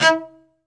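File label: SOLO cello, Strings; ILIO; Synclavier Strings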